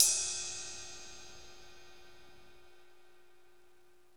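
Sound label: cymbal, Techno Rock; Northstar; Drumscapes Roland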